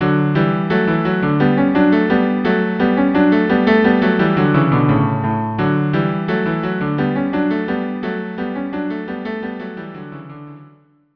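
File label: Gavotte Allemande cello duo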